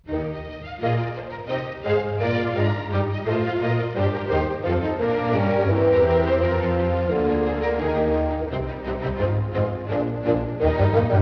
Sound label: mozart piano